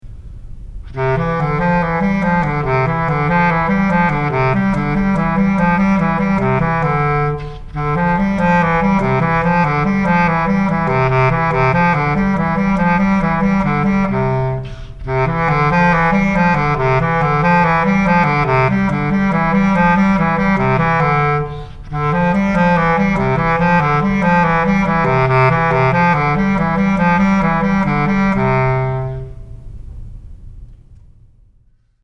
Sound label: Bass Clarinet Junior High